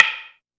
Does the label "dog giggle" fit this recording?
No